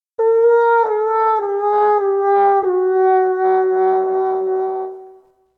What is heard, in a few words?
plunger mute